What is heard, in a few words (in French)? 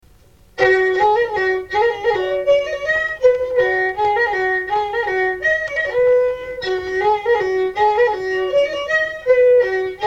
scottisch; Brise pied